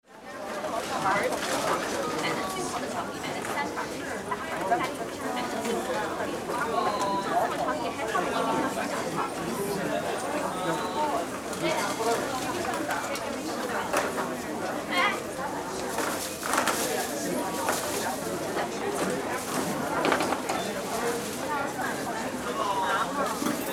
airport hall atmo crowded japan

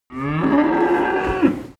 cow moo single

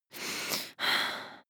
breath female slightly aroused

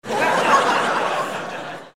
laugh

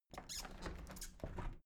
window plastic squeak open soft sequence

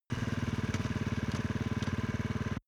motorcycle sport bike idle

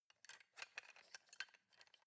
knives wood handle

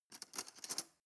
cutlery teaspoon drawer grab soft

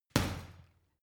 boxing punching bag uppercut hard

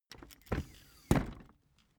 office chair hydraulics up

